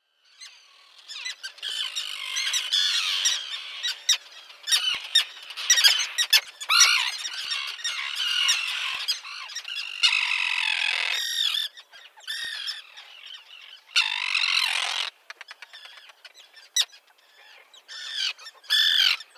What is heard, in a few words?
Common Tern